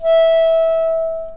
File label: E5 alt, flute; modern